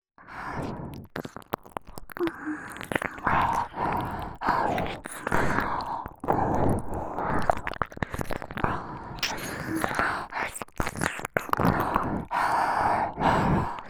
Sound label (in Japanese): 両耳同時耳舐め